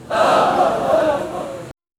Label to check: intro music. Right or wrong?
Wrong